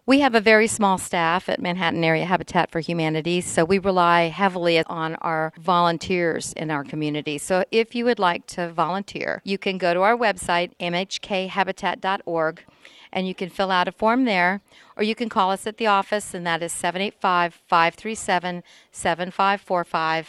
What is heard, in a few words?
melody; habitat for humanity's restore